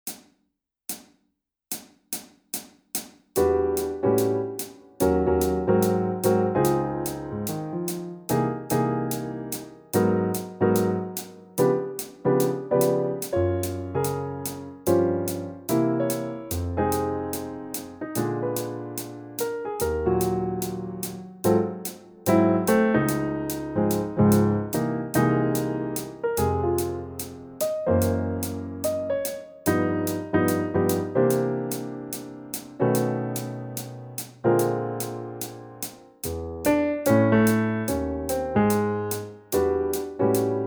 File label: Fast Tempo Piano Only